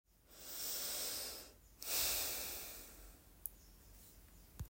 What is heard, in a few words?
Deep Breathing